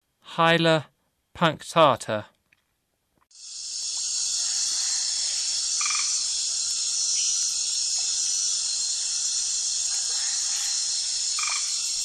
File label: Hyla punctata, Frogs of Ecuadorian Amazon; S. America